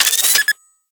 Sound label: Fortress Mine Deploy; Ambience; Gameplay; Warframe; Grineer